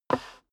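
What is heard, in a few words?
step dress boots wood creak slide short, footsteps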